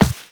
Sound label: Walk Default, footsteps; Warframe; Operator